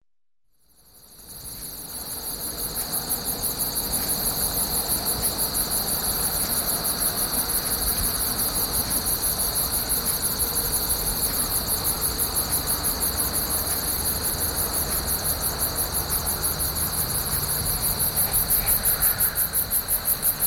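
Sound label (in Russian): звук сверчков на фоне транспорт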